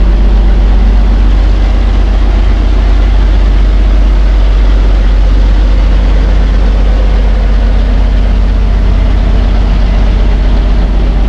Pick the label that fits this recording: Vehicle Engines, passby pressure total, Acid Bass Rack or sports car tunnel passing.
Vehicle Engines